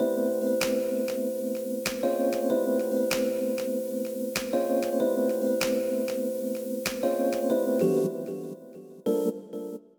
E. Piano; Keys

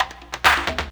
Electronic Perc

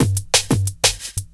Breakbeat, Drums & Percussion

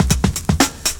Breakbeat, Drums & Percussion

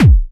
Kick, Drums & Percussion One Shots Drums

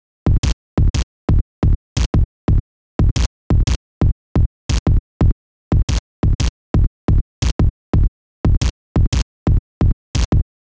Electronica Drumloop

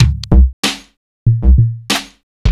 Hip-Hop Drumloop

